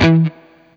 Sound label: BLU PICK, Guitar & Bass; 90 s Sample CDs; Quantum Leap - Guitar & Bass